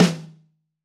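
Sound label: Room Snare; Roland BK-5; Snares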